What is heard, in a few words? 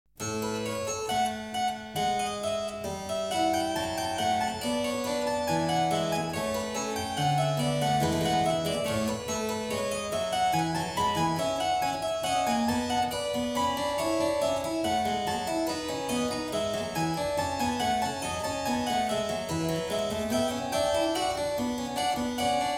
Prelude in A major, clavier; Prelude & Fugue No.19; BWV 864; BACH; WELL TEMPERED CLAVIER; BOOK I